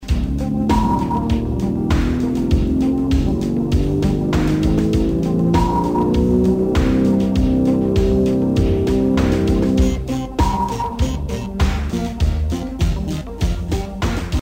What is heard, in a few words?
music